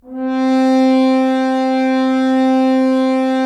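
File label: Solo French Horn; Roland - LA Composer vol. 4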